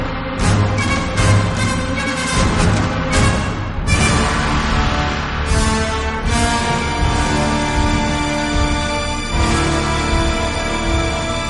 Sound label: high tension action soundtrack; heavy rain ost